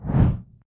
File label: begin whoosh